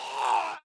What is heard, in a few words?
Death Voice